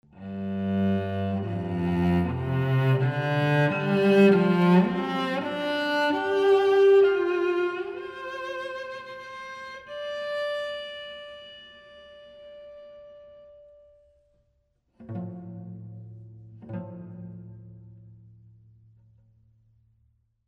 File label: Cello, Concert Hall; Bricasti